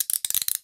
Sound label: cuff end, Handcuffs